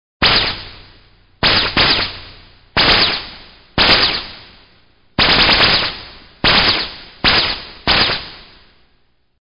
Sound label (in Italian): armi